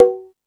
Latin Drums, E-MU Pro-Cussion; drum machine

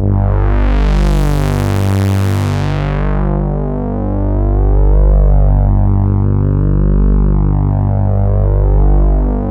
full analog; Sound Gallery - Charged

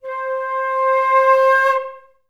FL CRSC C4, Advanced Orchestra; Best Service

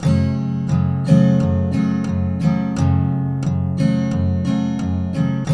TK MIN G 2, Guitars; Spectrasonics; Hans Zimmer Guitars VOL-1

